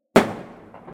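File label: sound of firecracker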